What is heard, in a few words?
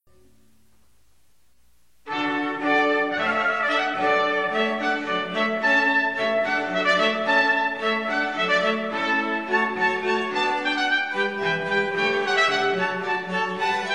hornpipe from water music